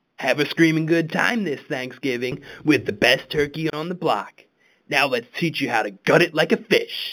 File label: Scream